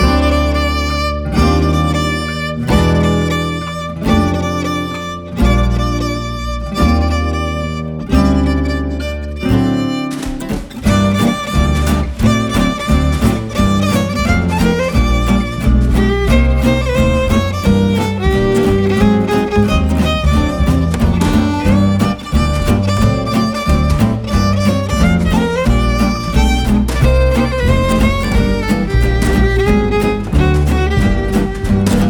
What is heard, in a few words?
Douce Ambience